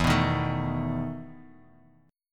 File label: E Major 7th Suspended 4th E; EM7sus4 strummed